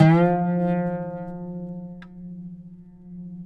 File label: OUD F 2; Spectrasonics; Hans Zimmer Guitars VOL-2